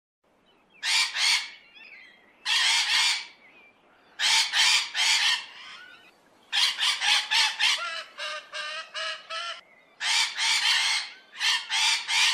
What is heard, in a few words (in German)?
hellroterara; zootiere erkennen